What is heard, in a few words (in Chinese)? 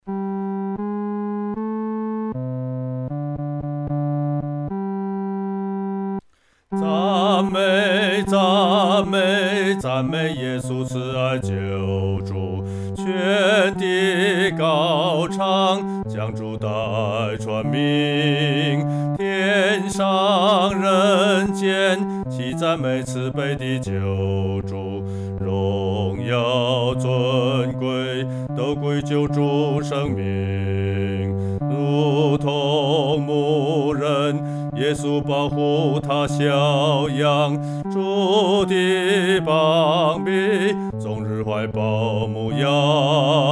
独唱 第四声; 赞美耶稣